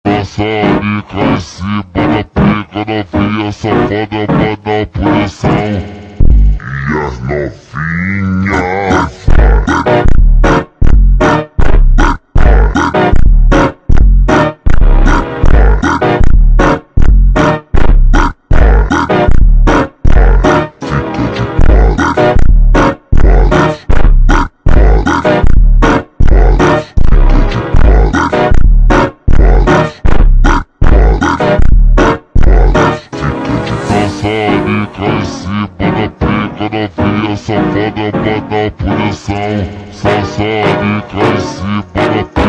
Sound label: Montagem ultra Slowed